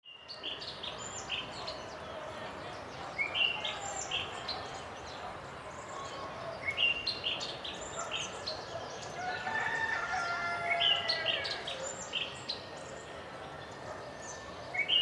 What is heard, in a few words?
farm crowd